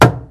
wood box impact hard; physics

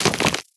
walk, antlion; creatures

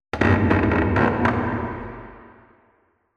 wood creak low; Half Life Alyx

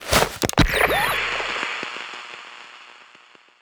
radio headset put on; player

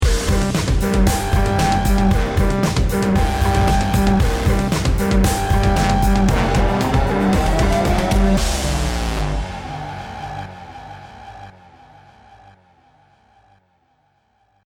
bomb ten sec count

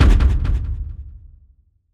strider steps lfe; creatures

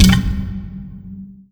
fs fm lightbridge, footsteps; player; Portal 2

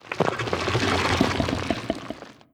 idle subtle, barnacle; Half Life Alyx

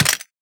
weapon pistol impact; physics; weapons

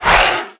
grunt soft, antlion; creatures; vox

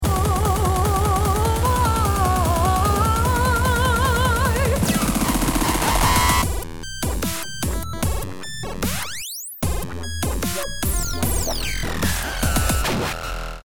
start action, music

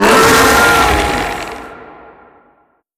death, zombie vox; creatures

